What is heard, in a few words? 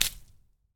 Splat; CastleMiner; Xbox 360